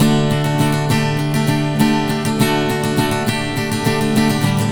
Strummed, Acoustic Guitars; ACID MUSIC

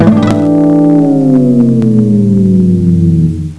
Scratch

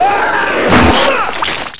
BIG GIBS; MacAddict; Carmageddon Demo

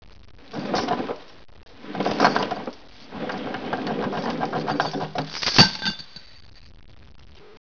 chains

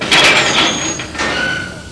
OPEN GATE; Wrath

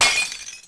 Glass; Urban Chaos

